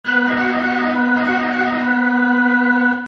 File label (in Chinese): 主场风琴上行; 战中; 战斗音效; nba